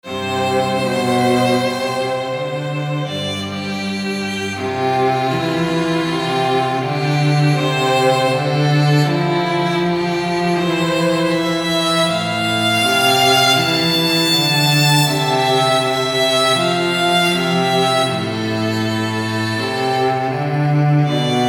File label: Cello and Violin